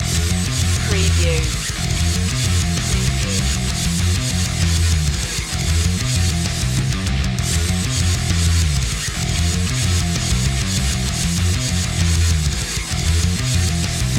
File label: bass heavy jams